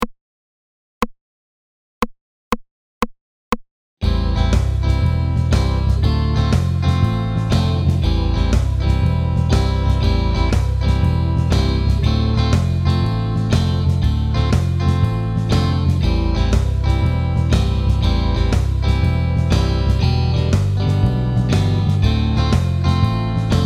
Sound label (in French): mineur 120